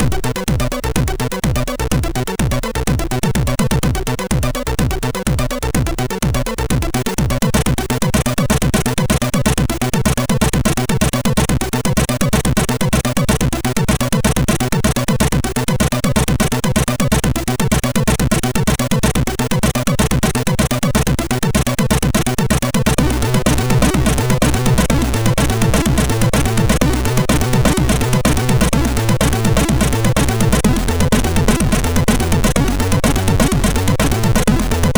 Pierdu, MOS6581R2

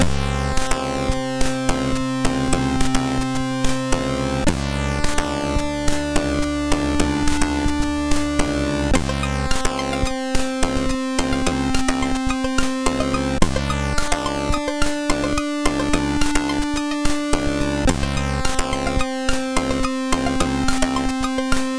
MOS6581R2, sid; Nine Rings; Dr Terrorz

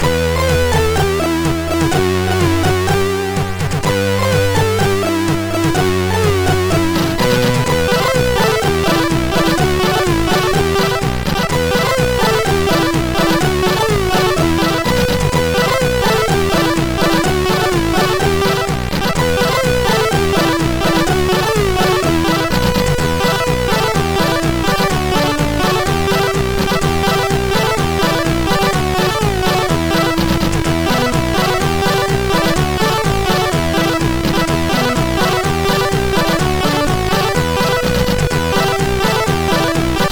MOS6581R3 sid; Irish Coffee

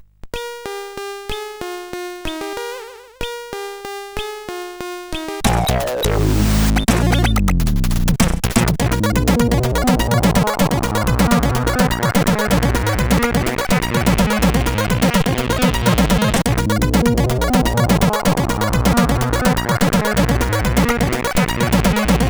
sid CSG8580

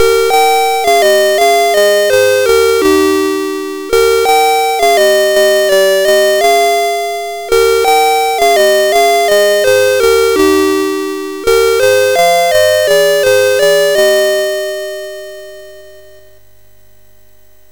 sid MOS6581R3, GAMES; Alice In Wonderland

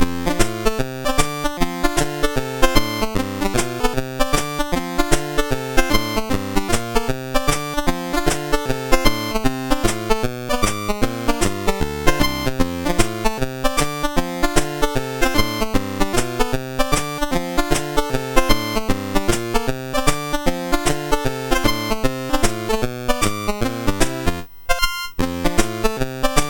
Juke Box, MOS 6581 R4

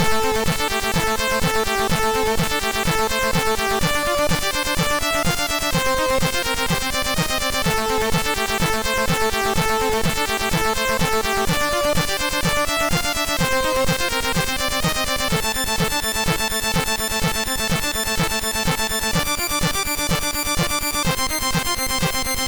Techno, MOS6581R2

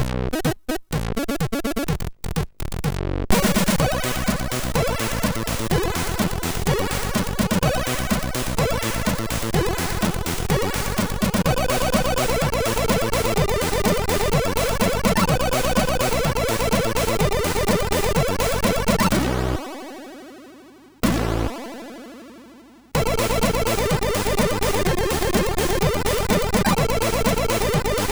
Fast Menue, sid MOS6581R3

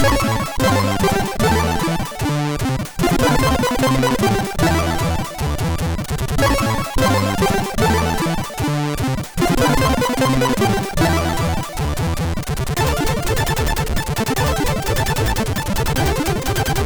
Orbtro, sid MOS6581R3

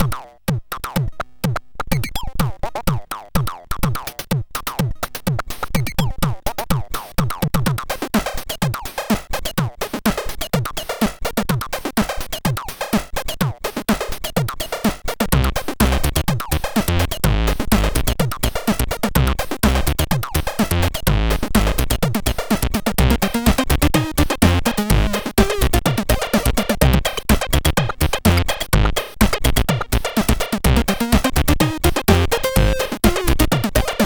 sid CSG8580

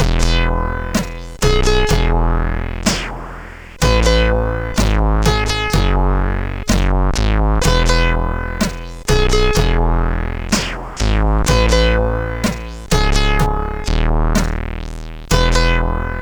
sid CSG8580; Plov Fripperies 1; hvsc

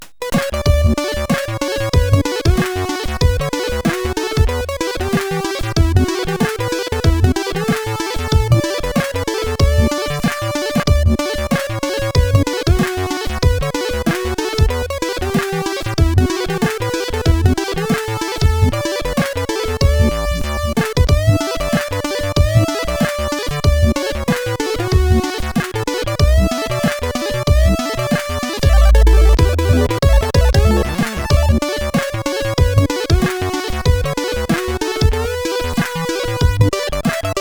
Christmas sid CSG8580R5

